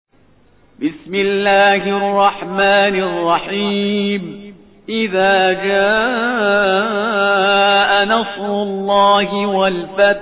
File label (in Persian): ترتیل سوره مبارکه نصر; شهریار پرهیزگار